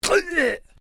MALE VOCALIZATION EEGH; Male vocal sounds; Exclamations; Voice

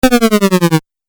8 Bit Style Vibrating Fall; Video Game

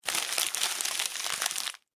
Office Paper Crumple Long; Materials

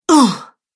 Female Fighter Grunts; Grunt Sound Effects

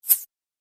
Whoosh; sound design; Swish, Zoom, Swipe, Whoosh, Swoop, Transition

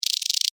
Insect Rattle Click, Insect, Rattle, Clicks, Bug; Animals:Insects; Insect Movement; Blastwave

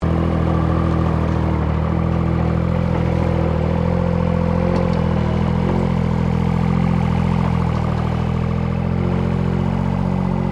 Construction Earthmover Small Operating Loop; Various construction machinery

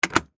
Button Switch; Multimedia Web Interactive; Technology; Blastwave

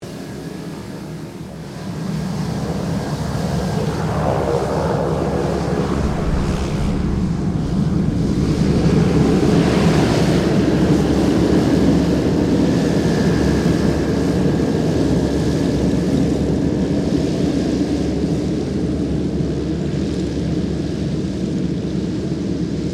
2007 KING AIR 350 TAXI; Airplanes; Vehicles

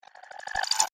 Electric Impulse Whoosh

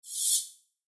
Whoosh High Tech; Multimedia; Blastwave

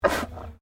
Animal Alpaca Male Snort; Alpacas on a farm in Gippsland Victoria

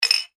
household glasses beer x2 clink; Beer Drinking Glasses (X2) Clink Together; Clinks; Objects; McKinney Sound

